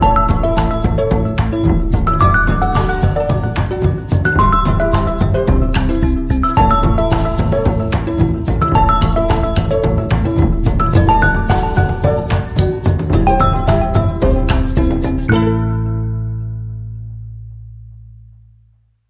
Percussion Party

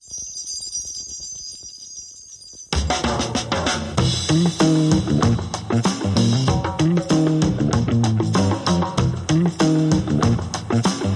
Drums and Bass Mix

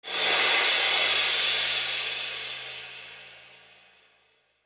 Quick Roll

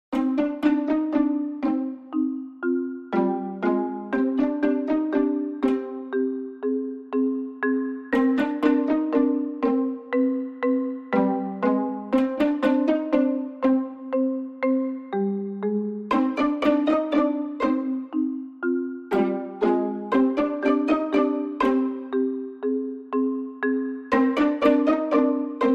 Strings and Marimba Only

